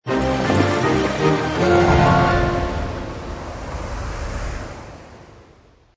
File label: ship travel depart